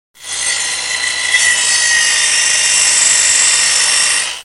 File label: Spinner; mega boulder rampage gamerip